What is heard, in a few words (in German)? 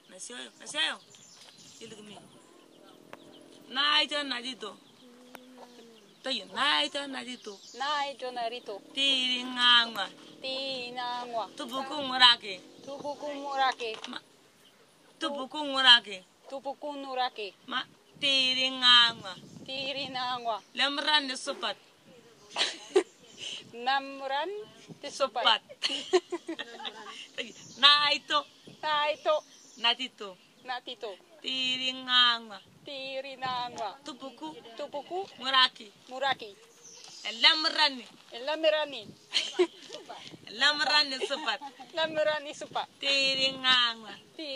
Gesangsunterricht